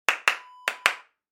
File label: hand clap